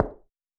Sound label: High Heel